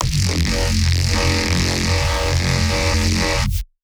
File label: NEURO ROBOT, bass